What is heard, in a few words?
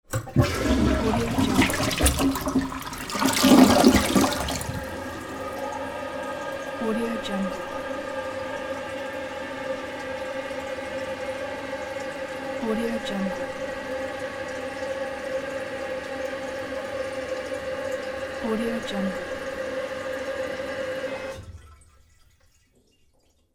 toilet flush tank fill